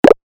move

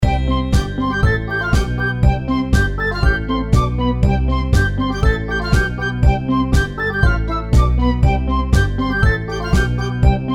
pop 120 BPM loop; アドベンチャーゲームやノベルゲーム; Adventure Game Music Pack

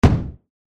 shield; 武器効果音

